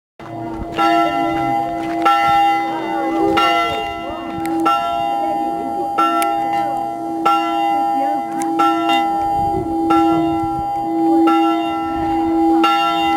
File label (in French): CLOCHE EGLISE; LA CHAPELLE POUILLOUX 79